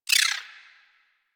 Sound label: Dex Sybaris Tech A; Warframe; Weapons; Tenno